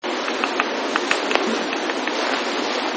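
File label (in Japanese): kogera, Japanese pygmy woodpecker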